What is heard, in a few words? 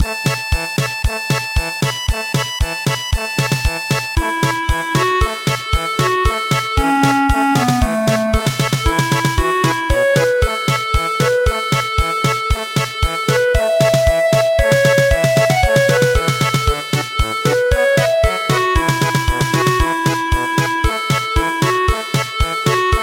German Polka, midi